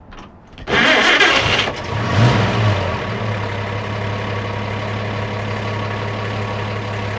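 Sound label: Chevy Truck 46 Start Rev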